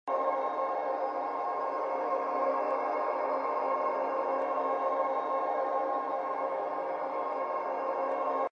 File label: Ambient Sound (Loop)